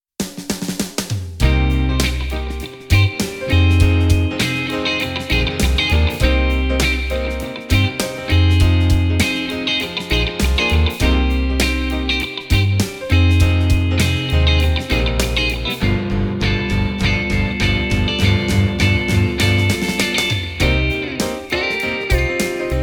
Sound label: beat